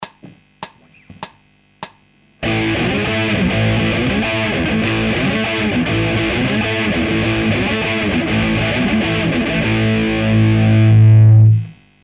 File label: Hard Guitar Loops